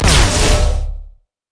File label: crit attack; LoL; velkoz skin01